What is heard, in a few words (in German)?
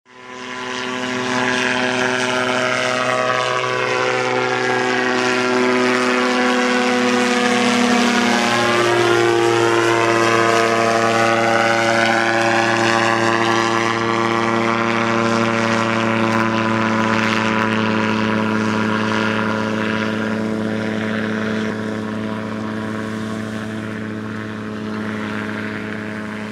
motorflugzeug